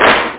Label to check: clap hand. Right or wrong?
Right